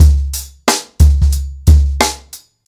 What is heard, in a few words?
90 bpm drum beat C# key, Free breakbeat - kick tuned to the C# note